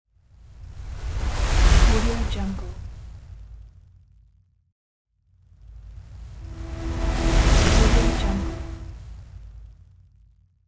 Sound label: transition and movement